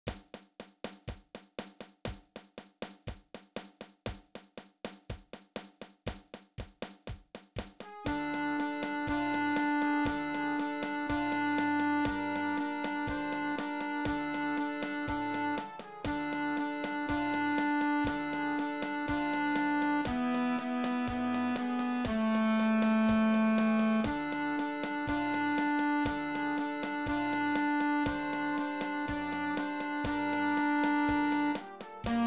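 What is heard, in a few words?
clarinet 2, alto sax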